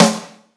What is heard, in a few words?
small reverb acoustic snare sound G sharp key